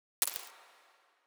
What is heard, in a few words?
Shakers; One Shots; Touch Loops; CosmicFunk